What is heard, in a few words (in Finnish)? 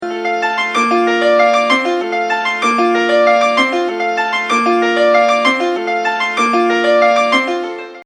piano